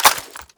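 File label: magazine empty, f2000; svencoop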